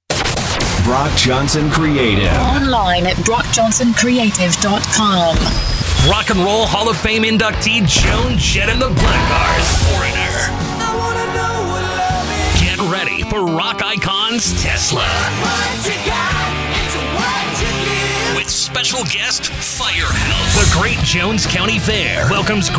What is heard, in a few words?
CONCERT CLASSIC ROCK; BROCJOHNSONCREATIVE